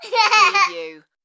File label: Toddler Laugh